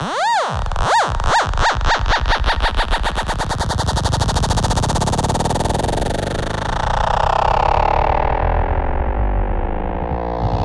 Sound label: Scratching FX; Vengeance - Essential Clubsounds Vol.4; Effects